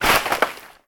Throwing Cup In Sink; Still Life; Windows

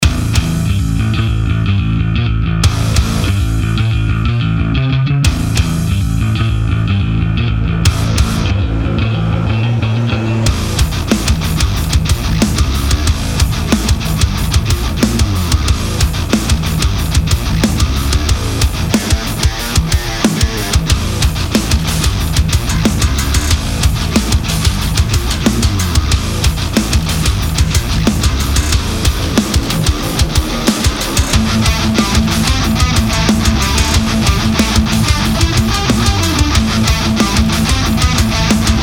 Metal 92bpm; Ultimate Zombie Defense; Windows

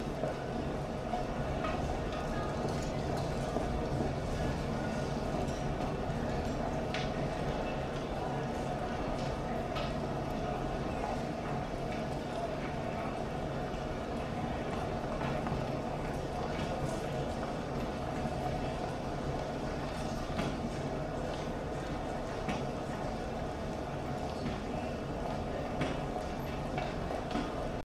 subway play for tips crowd loop; The Sims 3; Windows

